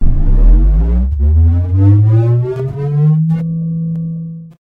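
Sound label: Shield Sweep Recreation; Halo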